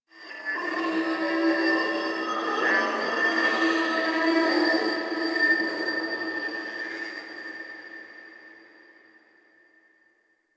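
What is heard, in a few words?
Glass Texture Medium, Ambience; Warframe; Cephalon Weave; DE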